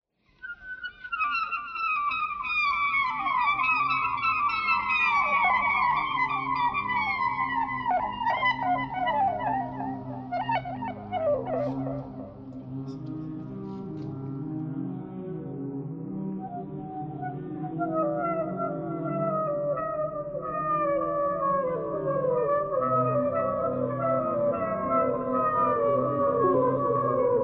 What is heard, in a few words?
clarinet jazz clarinet thread noise; clarinet geese